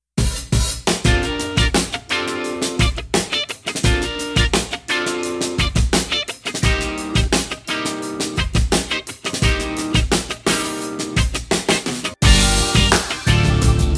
Back and Forth Travelling Time Step and Break Music